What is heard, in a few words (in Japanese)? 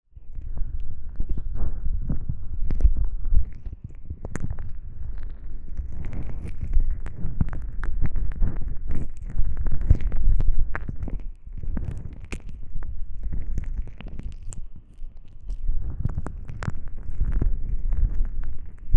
スライム耳マッサージ (両耳同時), 声なし; スライム娘ちゃんの出張リラクゼーションサロン！?